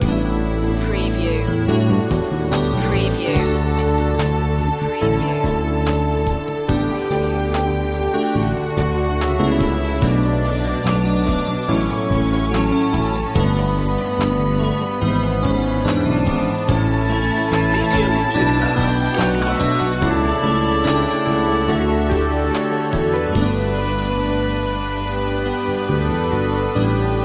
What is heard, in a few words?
classical romance